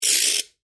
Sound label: Ratchet Single; Hand Tools; Sound Effects:Tools